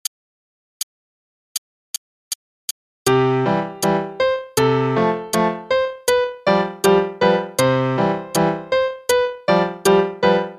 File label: Trumpet Concerto (qn=160); Faber; Adult All-in-One Book 1